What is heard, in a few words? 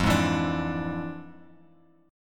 F Minor Major 13th, Bass Standard